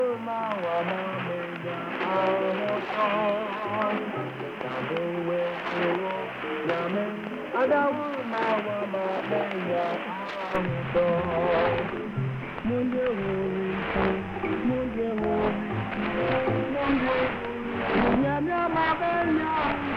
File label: VOA Madagaskar 15580khz AM